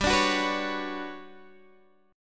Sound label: G Major Sharp 11th, Guitar, Open G; chordshape; down